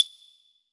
sticks, drums; Yamaha SY85

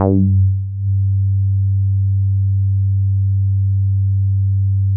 Synths BASS

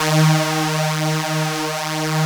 PULSA D#2, LEAD SYNTH; Best Service Alpha Dance vol.2

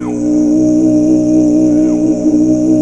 tibetan

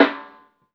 LO-FI KITS; Spectrasonics; Burning Grooves CD2

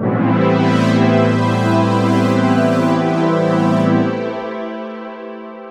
Pad, Keyboards

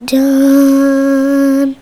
CHILD, Indian Traditions

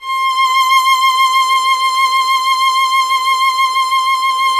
violin, Solo Instruments; Miroslav Vitous - Solo Instruments VOL-2

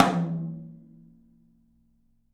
open tom; 3D Sound; 3D Drums; 10 TOM

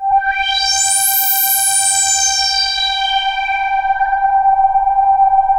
RESO G4, BASS; Q Up Arts; Dream Experience

